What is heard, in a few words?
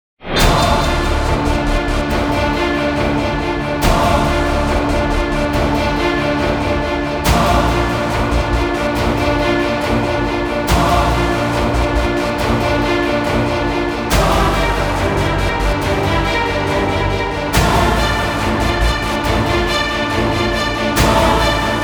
battle, Music; SpaceGame